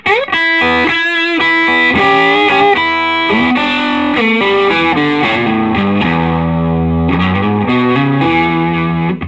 SOLO E, Vintage Blues Guitar

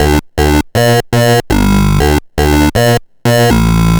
Sh09 Bass Loop, 120BPM

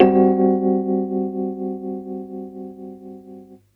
RHOD; USB Soundscan vol.32; Roots Disco; AKAI

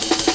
amen pt, amen particles

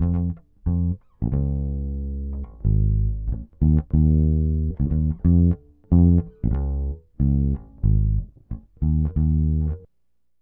bas Ab, Hip Hop & RnB; Best Service ProSamples vol.34; Tantrum

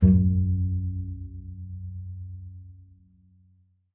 F#2, bass pizz; Xsample VOL-5

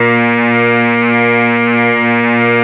2600 C4, ARP 2600; Hollywood Edge - Giorgio Moroder Rare Synthesizer Collection

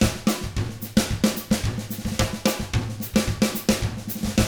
110 SAMBA, Drums; ILIO; Double Platinum Drums 1

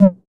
606 Dist. Tom

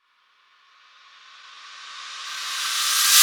Reverse Cym, Cymbals

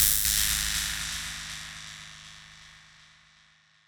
Wire Fence

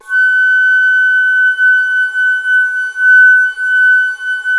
ALTO F#5, ALTO FLUTE; USB Soundscan vol.23

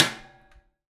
Prepared Drums

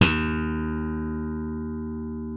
D#2, slap thumb; Xsample VOL-1

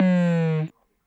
Drop Lo Speaker, Stylophone Beatbox

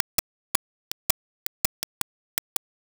Glitchy, Beats; Sonic Foundry (Sony Creative Software)

